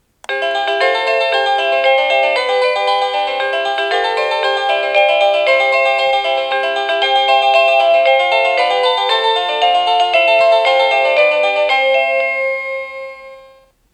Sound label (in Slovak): Ukážka melódie zvonenia zvončeka